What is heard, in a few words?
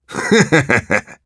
laugh evil, ru; Engineer